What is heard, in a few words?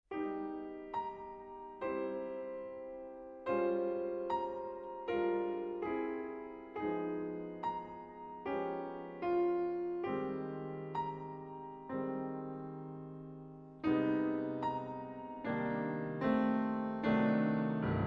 bachianas brasileiras no4 coral canto de sertāo